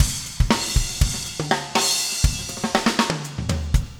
funk, 120bpm, loops

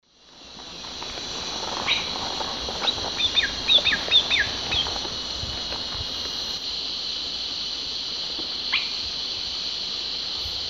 Cacicus sclateri, song and calls; S. America; Eastern Lowlands

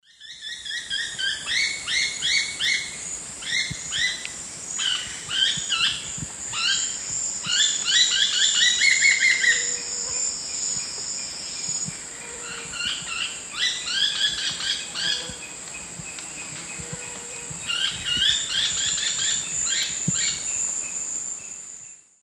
Cnipodectes subbrunneus, calls; S. America; Eastern Lowlands

